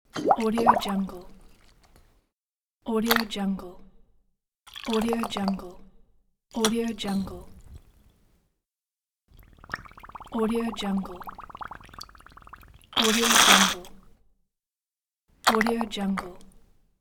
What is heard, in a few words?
pop bubbles; cartoon